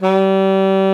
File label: SLID G3, ALTOSAX; Xsample VOL-2